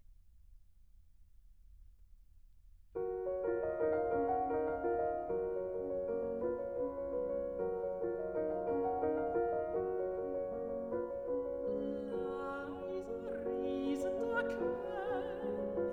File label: song for voice & piano; Schubert